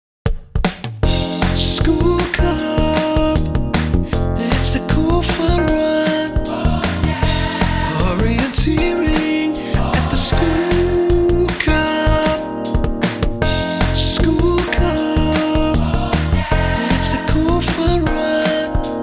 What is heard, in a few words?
jingle